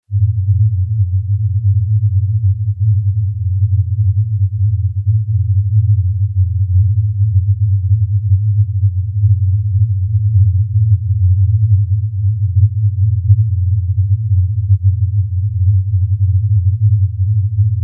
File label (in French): bruit rose